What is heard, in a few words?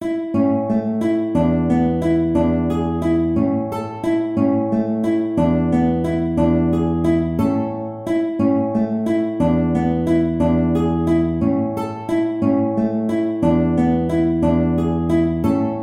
Waltz A guitar; Carcassi